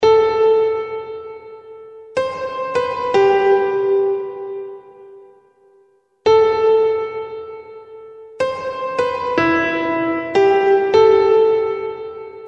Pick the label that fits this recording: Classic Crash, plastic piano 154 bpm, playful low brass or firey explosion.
plastic piano 154 bpm